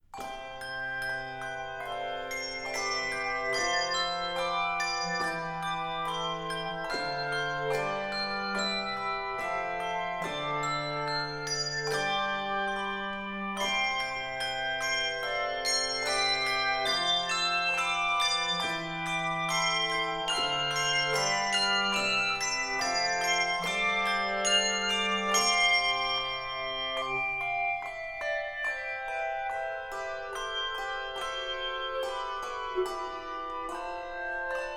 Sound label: Handbells 3-6 Octave